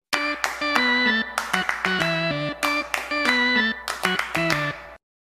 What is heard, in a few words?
funky clap theme; chikn nuggit